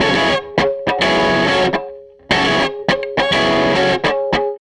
SG Guitar Loop

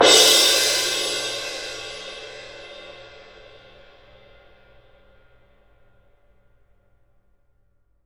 CYM F, Percussion; ILIO; Synclavier Percussion 2; World & Orchestral

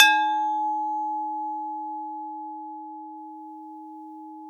TUB EB4, tubular bell; SoundBytes - Early Patches

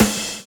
RIDE FILL; Univers Sons

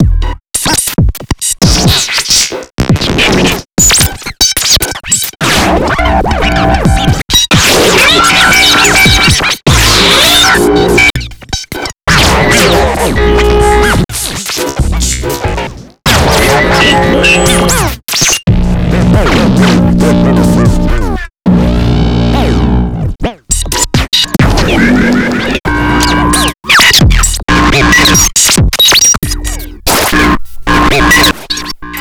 Noise Patch

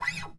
STRING 5, Bass; MARTIN AC 4; Sampleheads; Will Lee Bass Library

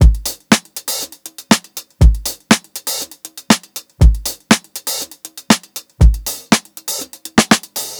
120 16TH BEAT, DRUMS; BASEMENT DRUMS VOL 2

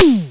Synthe, Wersi Prisma-DX5

